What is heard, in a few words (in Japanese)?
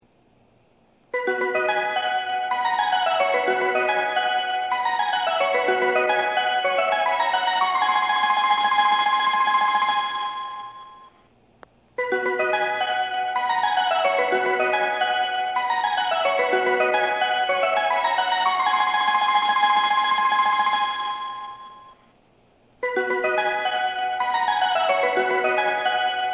発車メロディー; 3コーラスです!; 東海道線; 熱海・伊東・沼津方面; 先行が定時で通過すれば2コーラス目に入りやすいです。